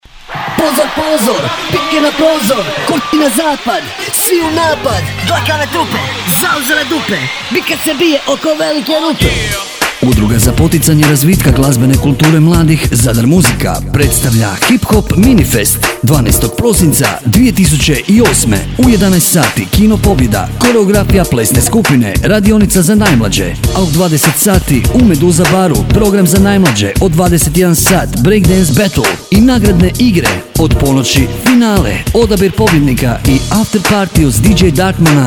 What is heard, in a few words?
hip hop; minifest